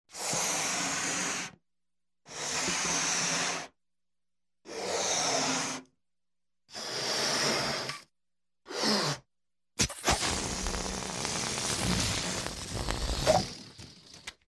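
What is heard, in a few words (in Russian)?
шарик надувают шарик сдувается в конце короткий пук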